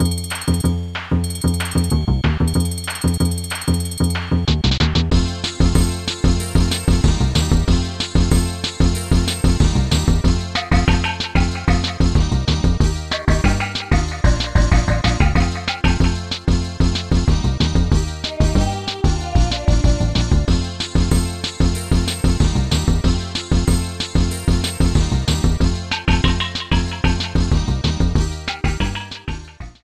School House Harmony; DKC2 SNES

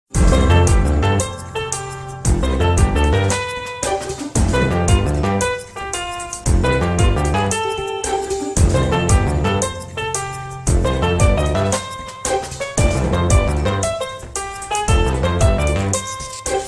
Clock Tower Theme

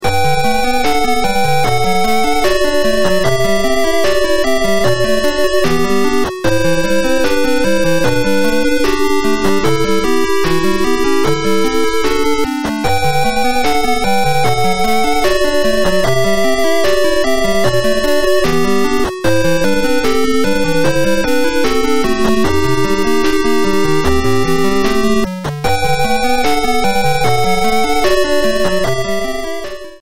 ending; TA GB

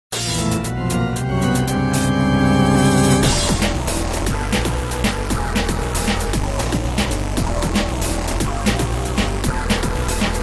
Music Bowser Castle, Background music; MKDS

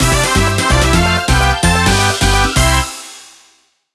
Mini-Game Win; Mario Party 6

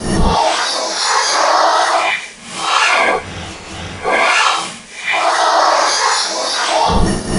Ghost Screeching Sound; LM

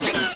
Cry; PM; Fearsome 5

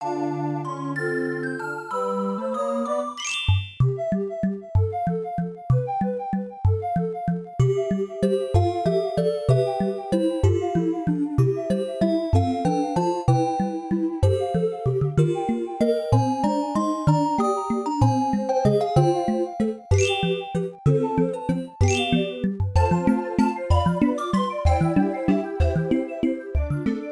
Underwater Theme

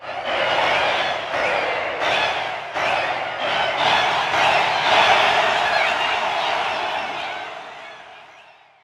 Roshambo Start Cheer

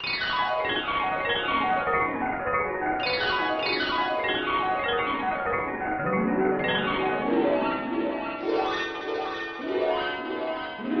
Falling Star, Song; Mario Paint

